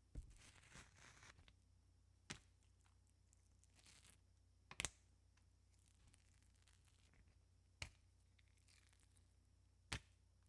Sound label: bacon peel and flop